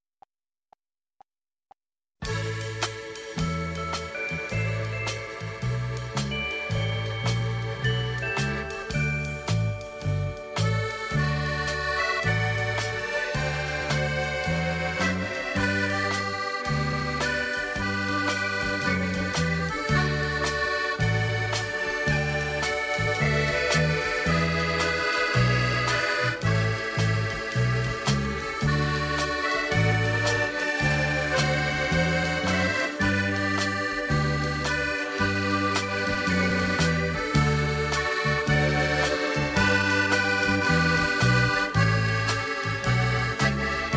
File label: Accordion Medley, D